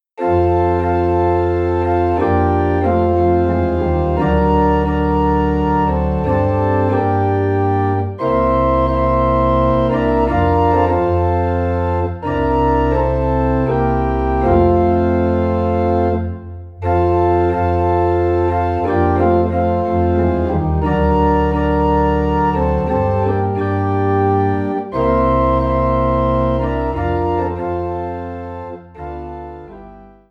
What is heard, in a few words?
Organ in F